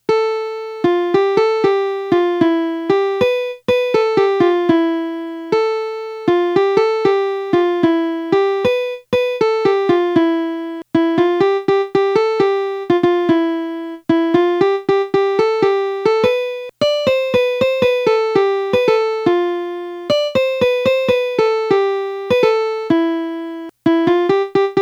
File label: melody